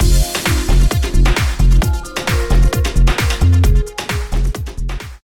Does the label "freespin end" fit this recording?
Yes